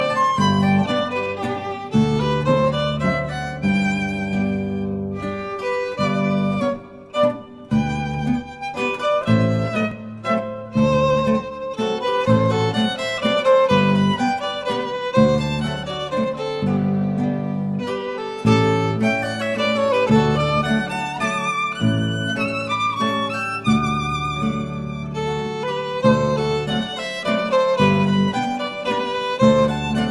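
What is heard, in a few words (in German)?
Vorgeig Tänze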